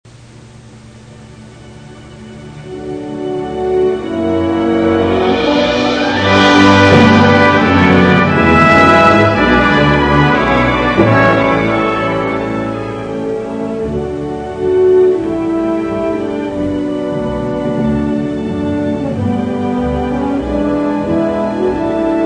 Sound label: orch